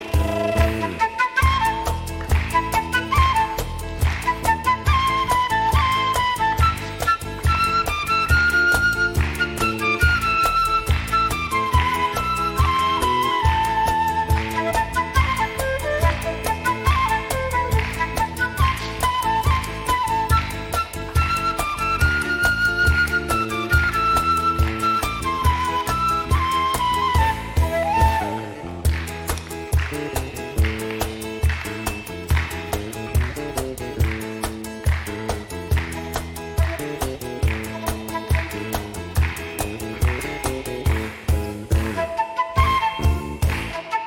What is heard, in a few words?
Transverse flute